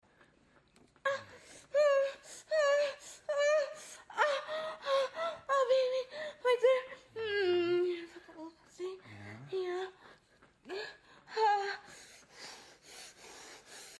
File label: sex, moans; The Witcher Hunt